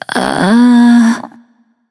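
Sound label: nonverbal seriously; smite; voicepack; izanami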